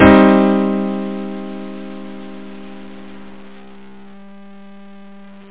Grand Pno Min6; Chords

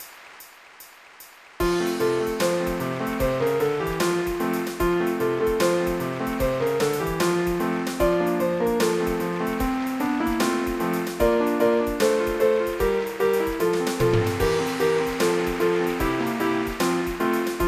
DINGDONG

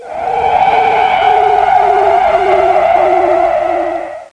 Ufo enter; Word Wizard Games

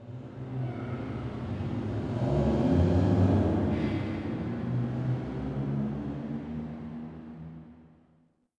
city, zones; Zoom3City

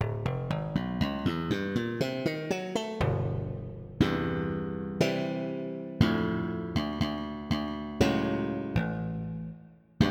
Funk Slap Bass

